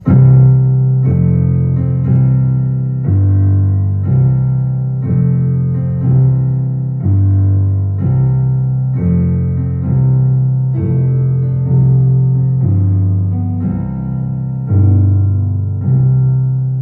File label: C-major clean